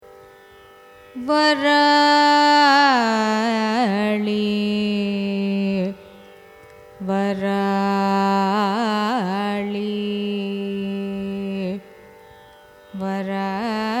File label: raga varali signature, carnatic music